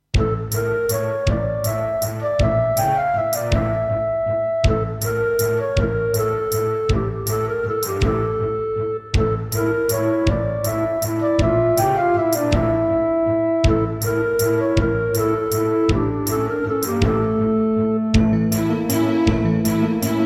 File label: Korg Super Sound Module